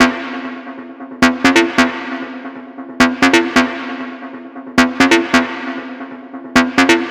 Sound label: Synth Loop E 135, Techno; Ghosthack; AC2024 - Day 1 - Techno Sounds 2025